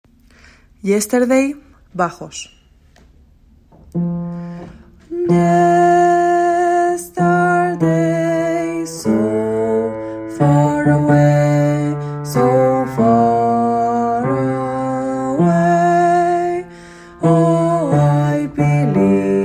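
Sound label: bass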